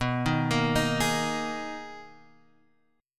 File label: B Major 13th B down Guitar Half Step Down